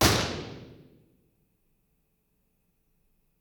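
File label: impulse response stereo; spring lane building university york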